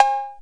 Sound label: 808 Cow; Quasimidi Rave-o-lution 309